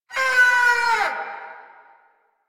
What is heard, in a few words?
Scream; Grineer Queens Guards; Warframe